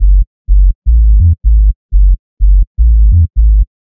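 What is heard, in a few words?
Sub Staccato Loop, 125, G minor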